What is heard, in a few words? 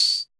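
CR78 tambourine; R+B KITS2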